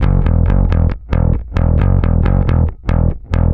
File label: 135 Garage Bass Emin; Dance DJ; Digidesign; Pro Tools; Pro Tools Essential Loops